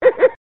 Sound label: heh heh cartoon; ooh ahh